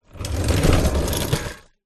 industrial mechanic garage creeper dolly wheels roll floor